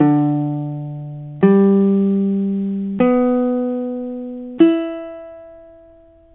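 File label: BARITONE UKULELE DGBE; BALITON UKULELE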